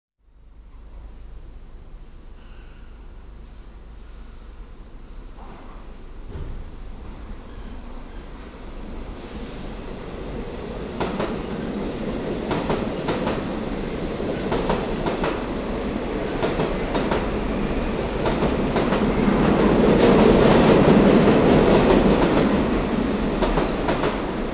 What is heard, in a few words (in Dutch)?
Trein Komt aan en vertrekt; KMP Film Video Sound Effects